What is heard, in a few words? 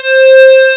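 Moa Aggressive Vocal Agree; FUNK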